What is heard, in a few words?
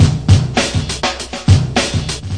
101 bpm, smokey, drum loops and breaks